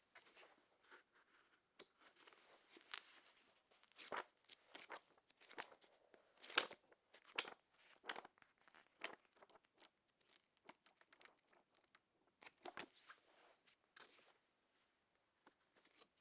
Page flip

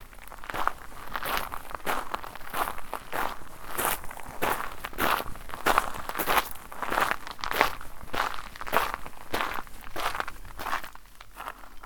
Footsteps Land

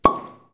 Cork Bottle Opening; Puisseguin Saint Emillion Chateau La Rose 2005

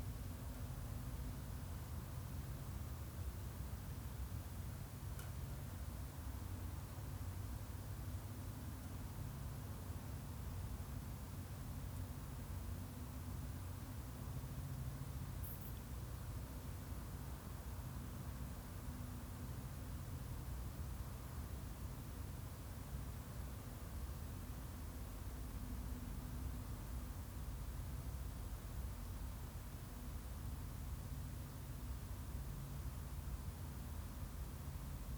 Outdoor Ambiance Night

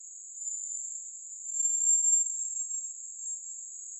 Threshold

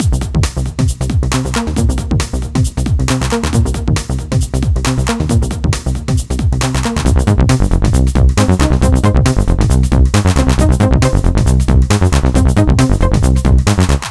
Jungle Gaming Loop

